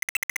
Sea Urchin Push

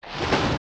stinger bounce; OOT

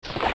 Sword Cut; Cutting an enemy with a sword; OOT

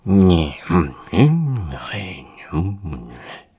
Sleeping; Sahasrahla; zelda

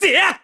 Sword Shoot; Link's Skyward Shot (charged energy) shouts; zelda

